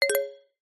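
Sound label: multimedia game sound mallet notify exclamation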